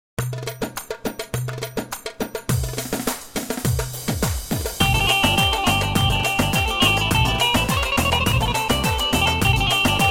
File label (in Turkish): e bag tavir, yamaha or700 v2plus e baglama